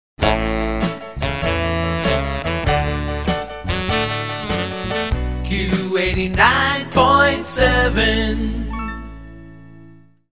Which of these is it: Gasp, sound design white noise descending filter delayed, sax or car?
sax